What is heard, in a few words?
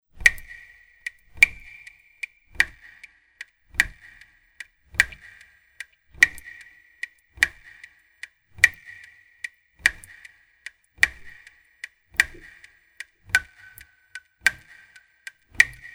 Atmosphere